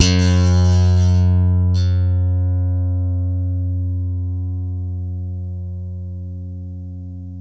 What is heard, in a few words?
FUNK, Bass; Spectrasonics; Bass Legends